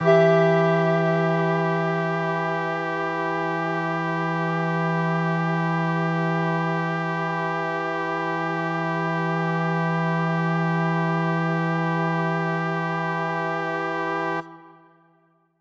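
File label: Mixed Winds E minor, synth orchestra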